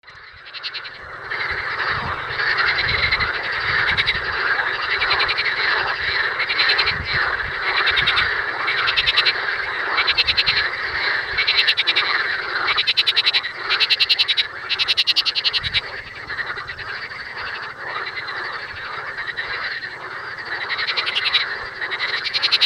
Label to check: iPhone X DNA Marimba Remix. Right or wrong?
Wrong